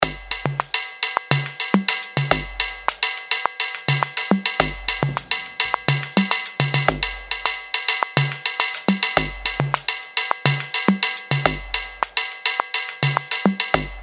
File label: RING BIGBEAT; HTC; Ring Tone; E-Club Ring Tone Package 1